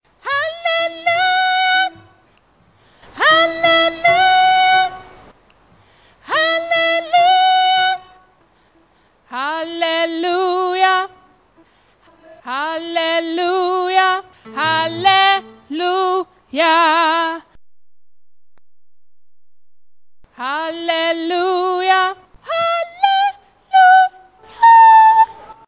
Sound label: tenor